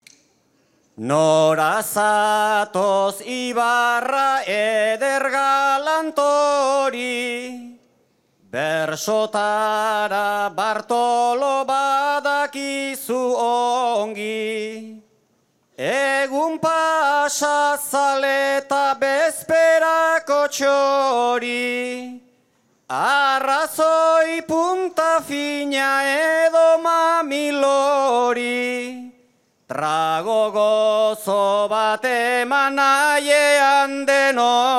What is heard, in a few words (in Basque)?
agurra, bertsoa; ibarra